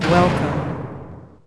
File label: door welcome; zingaro song generator